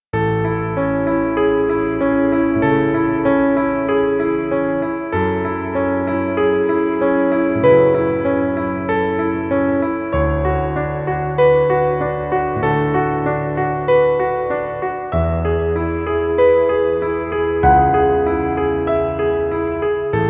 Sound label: Romantic Climate Piano music